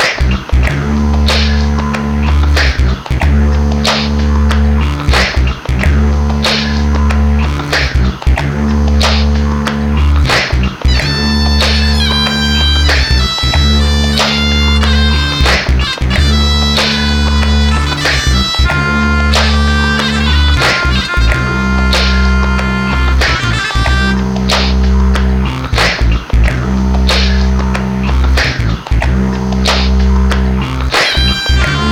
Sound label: chant; muzak